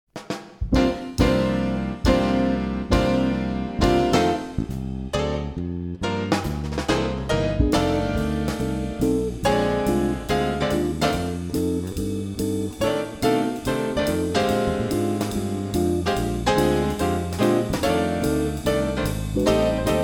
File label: Jazz F Blues Improv Etude, for Saxes & Brass